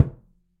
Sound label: Surdo Mute; Latin Percussion; Drums; Drum Hits; Ableton; Factory Packs